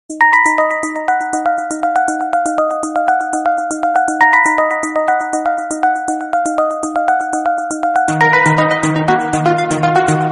ring ring piano background music tone; iphone ringtones

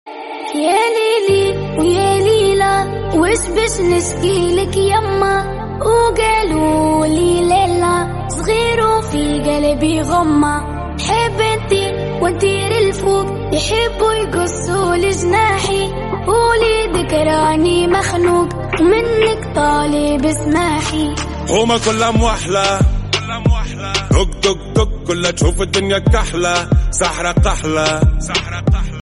soft arabic music tone ringtone

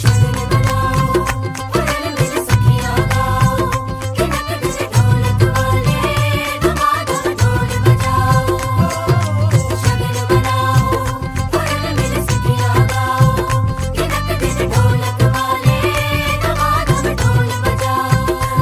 beats ringtone; kashmir beats season 2

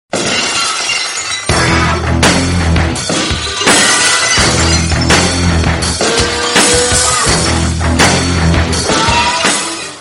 electronica background music tone

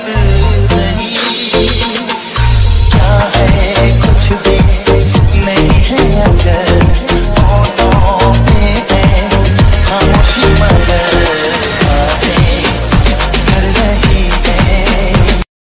sad music ringtone

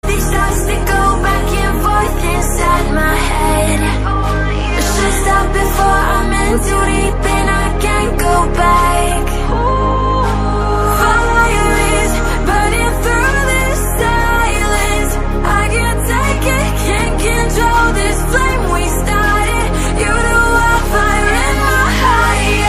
hollywood ringtone

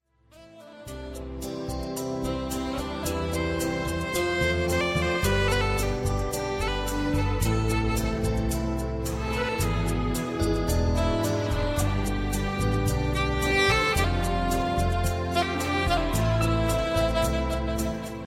sad guitar again ringtone

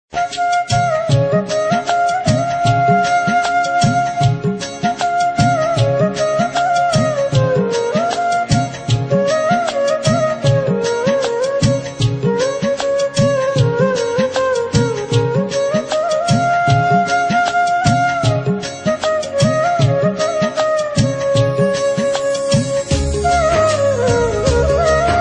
loving flute ringtone

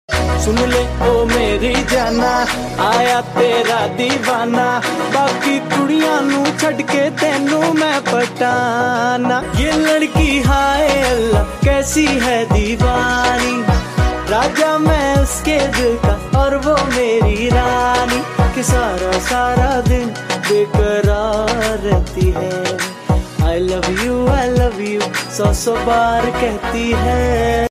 hindi ringtone

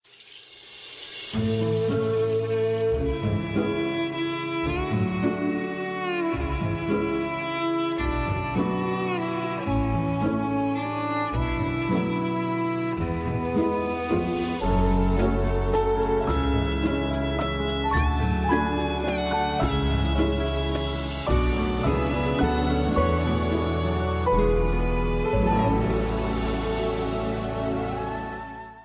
nokia ringtone; ramzan

